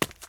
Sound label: concrete step; Golden Crayon Sounds